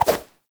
Run Whoosh; Enemies; Foley; Footsteps; Warframe; Lasrian Soldiers; Lasrian Butcher

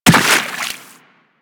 Run Water, Footsteps; Enemies; Warframe; Teshin; DE

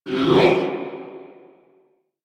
Impale Cast Vocal; Warframe; Warframes; Hoplite

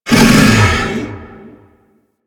Infested Bone Blade Vocal Idle; Warframe

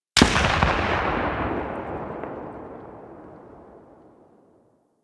Sniper Rifle Tail; Warframe; Tenno

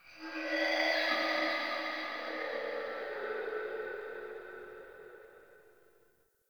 Texture One L, Ambience; Warframe; RailJack

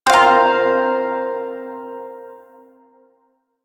Shawzin Pent Min Chord; Music; Chords

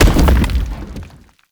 Blunt Heavy Impact Rock; Weapon Impacts; Player; Environment

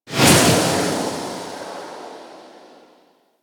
Void Arena Ghost Spawn; Warframe; Quests; Wraith Quest

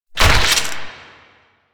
Nagantaka Prime Shot 2D, weapons; Warframe

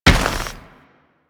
Combined Step Dirt, Footsteps; Enemies; Warframe

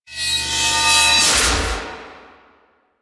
Sword Heavy Attack Charge; Warframe; Duviri; Dax; weapons

